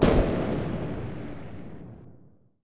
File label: explo; PC Gamer IT CD 41; machines demo